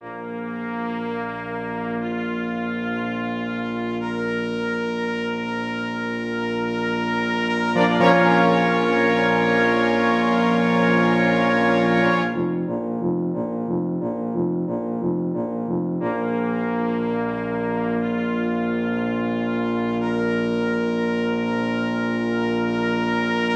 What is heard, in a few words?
Fanfare; HALFTIME NO. 1 - Space